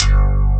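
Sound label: ACOUSTIC GUITAR; Pro-Rec - Synthsonic; BASSES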